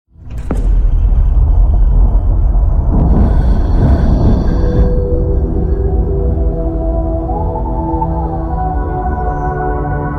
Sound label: Karaoke Version